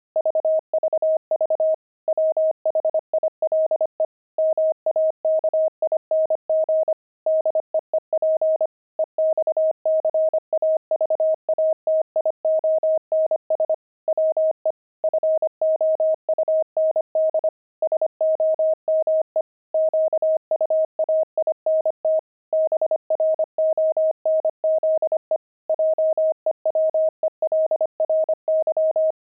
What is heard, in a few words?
pangram, intermediate pangrams files